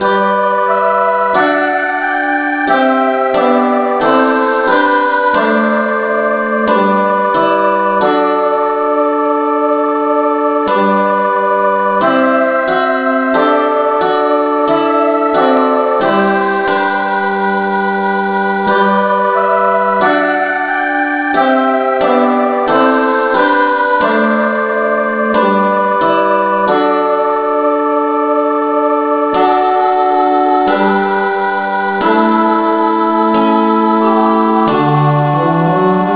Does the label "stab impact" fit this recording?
No